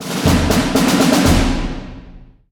flag captured

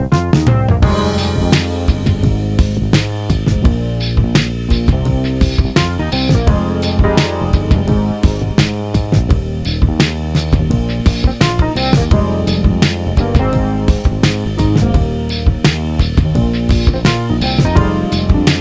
MG Music; thrillville off the rails

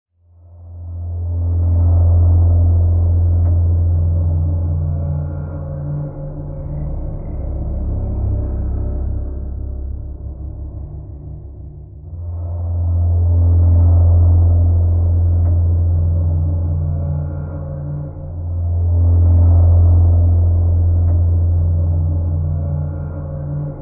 River Tension; Outlast 2; PS4 Windows Xbox One

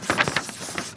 Writting

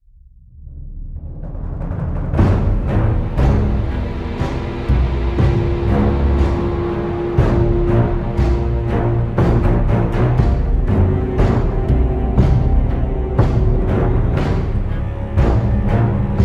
Loop Fight

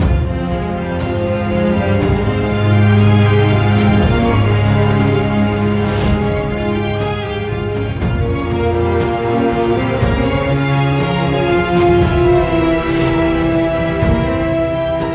String; Assassin's Creed Unity Dead Kings

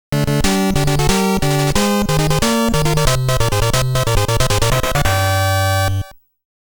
All Clear; Nekketsu Kouha Kunio-kun Ongakushuu

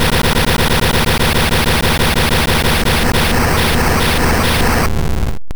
Fire Blast; sfx; attack moves; pokemon; gen 2; gsc

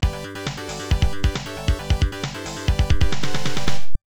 Round Win, x68000; bakuretsu aniki

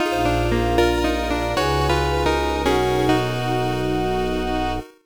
Jingle, Orb Discovery; YU-NO A Girl Who Chants Love at the Bound of This World

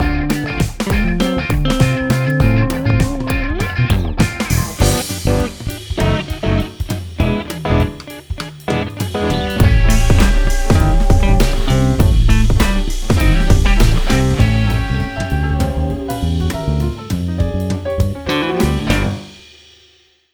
Robot Dance

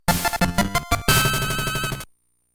Victory!; Kirby Star Stacker

